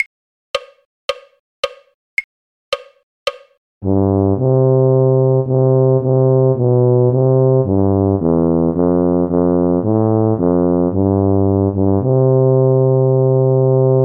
tuba; carols

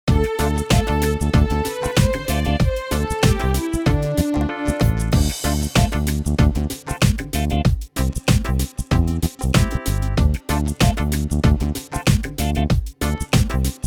backing; bassoon; drunken sailor; folk

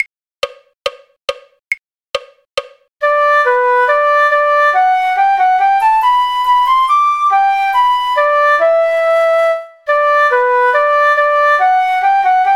flute; soldier soldier wont you marry me; folk

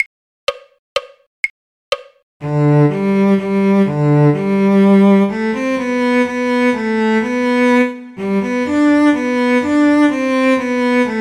cello; sweet nightingale; folk

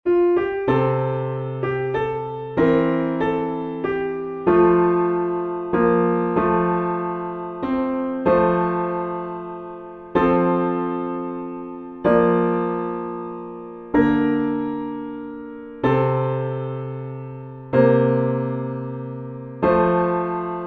turn your eyes upon jesus PIANO BACKING; tuba piano extra

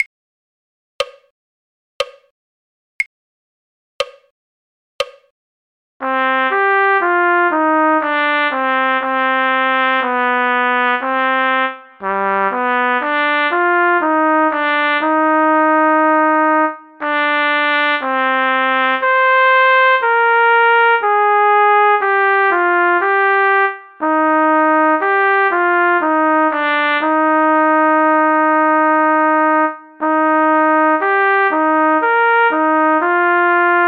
trumpet; David of the White Rock; folk

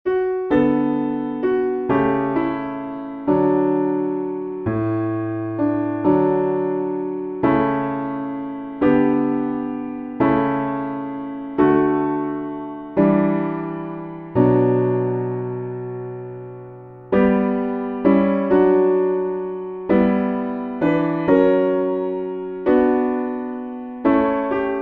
piano backing; tuba; This Endris Night

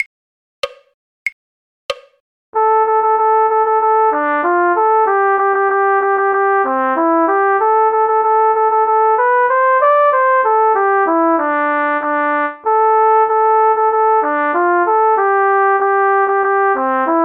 cornet; drunken sailor; folk

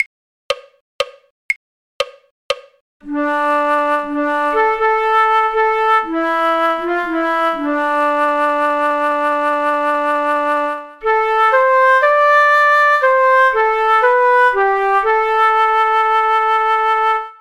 flute; scarborough fair; folk

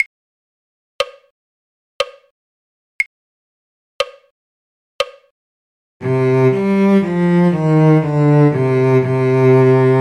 cello; david of the white rock; folk

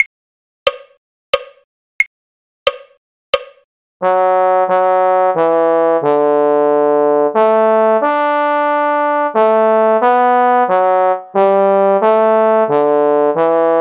trombone; carols

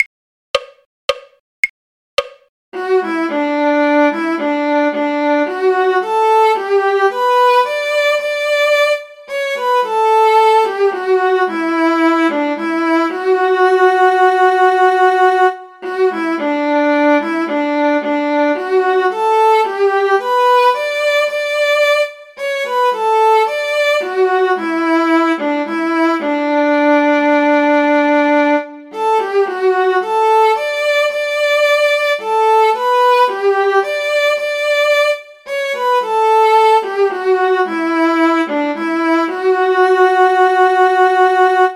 viola; folk

